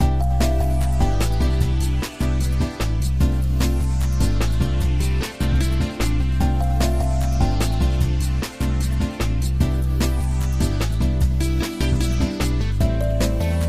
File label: BGM Frog; pangya fantasy golf pc